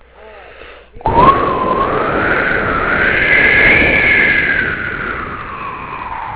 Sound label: Blowing, scuba